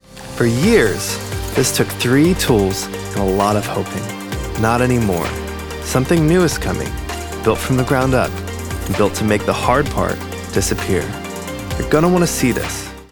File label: corporate product launch teaser; confident pull